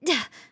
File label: damaged, RPG Voice; RPG Voice Starter Pack